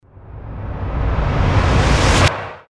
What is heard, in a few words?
Suckback Example